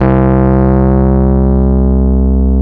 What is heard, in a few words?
C 3, Electric Pianos; Wizoo